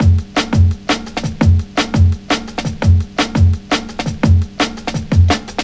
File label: Drum Break, Drum & Bass; Fluid Dynamics